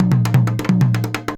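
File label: junjunba groove; Best Service; World Colours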